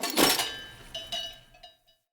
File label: Knight Melee Swing Foley; Characters; Enemy; Mendicant; MndKnight